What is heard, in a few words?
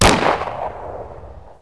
GUN, WEAPONS